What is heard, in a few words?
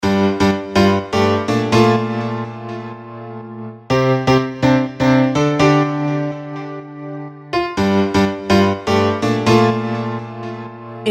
piano royaltyfreemusic; background music